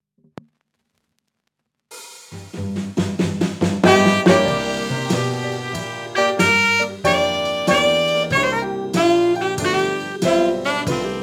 grammophone burlesque; Jack Keane 2 The Fire Within; Windows gamerip 2012

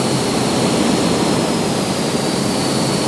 Jet Pack Loop

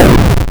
8bit explosion; gamerip; blastmorph pinball

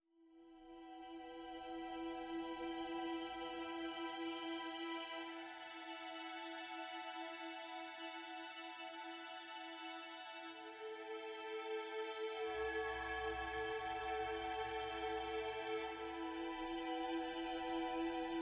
Theme Intro Stings Synth Loop; Shu; ps vita ps4 switch windows

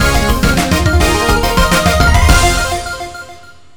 Content Unlocked; NBA Smash

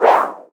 Whoosh Sound

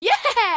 cheer; talking tom gold run gamerip 2016; Becca; Builder

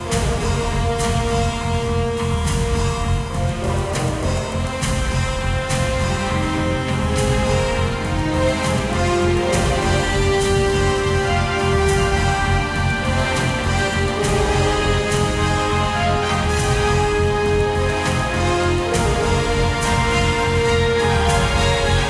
Music Loop; Choplifter HD

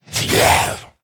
Zombie Monster Attack; Undead; Pain Train; Undead Collection

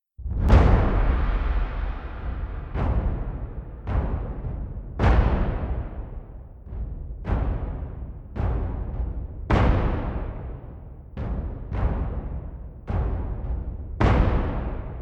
drums hell; TinyKeep

